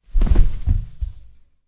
falling down

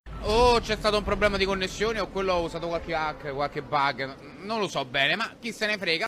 trrrrrrrrrrrra

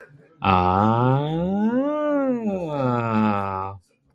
aaaaah

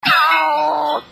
noid laugh ohhh

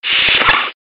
turbolift door; tos

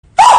hah

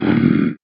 growl; talking ben

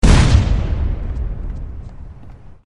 explosion

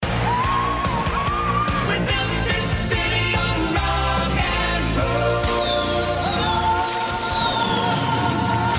woohoo song

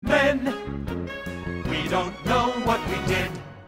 jingle

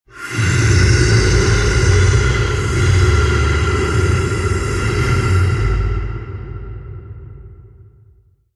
distant; deerclops

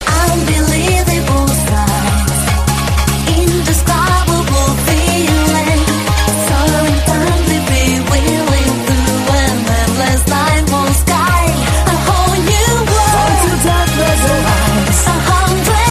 eurobeat; disney

